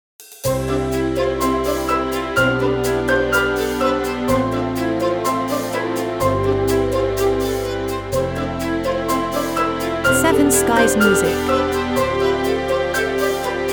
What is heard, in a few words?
Inspiring Vision